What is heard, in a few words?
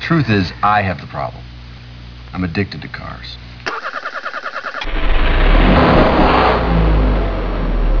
cars